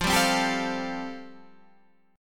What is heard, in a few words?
G 9th Suspended 4th